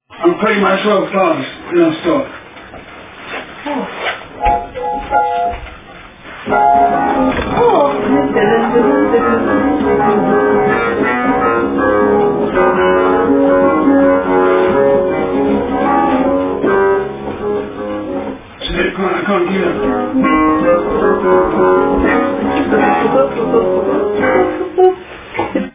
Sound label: piano